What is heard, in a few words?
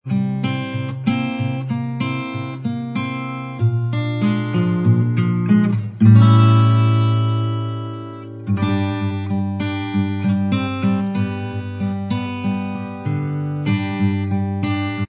acoustic Inst Track